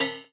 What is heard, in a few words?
Cymbal, jungle breaks drum sounds